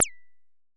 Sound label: Bird Chirp Click Thing Hi Bip Thing Thinger